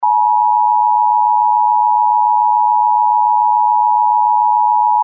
EBS test tones

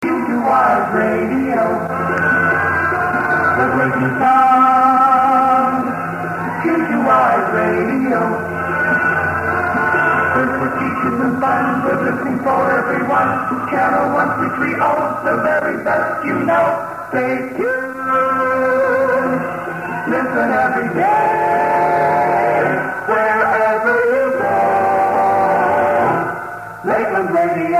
jingle; WYSE

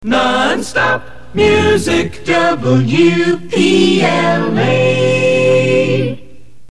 jingle non stop music; WPLA

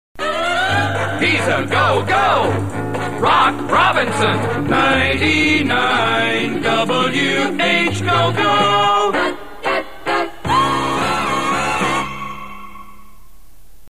jingle jock ID

